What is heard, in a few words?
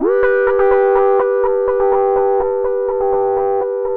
Analog Rhythm